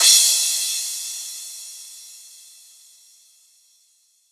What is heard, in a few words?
Crash